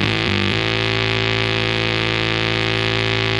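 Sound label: guitar grind to a halt; Loops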